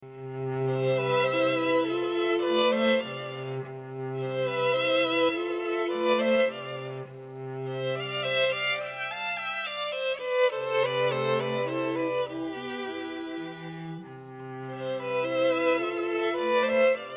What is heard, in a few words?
Flower Duet String Trio; Delibes